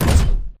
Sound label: Footstep Jog Close; Titanfall 2